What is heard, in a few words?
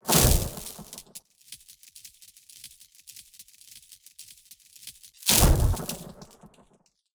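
shock draw sheathe big loop, magic, lightning; skyrim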